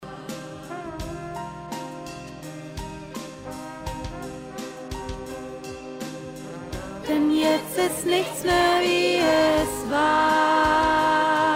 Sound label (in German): Chorstimme mittel